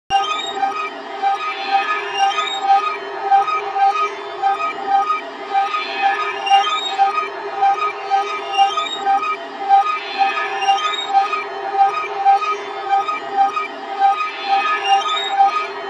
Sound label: radio; Milk outside a bag of milk... OST (2021)